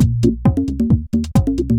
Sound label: Dance Drumloop; Drums; Dance Beats